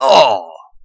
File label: human pain; biohazard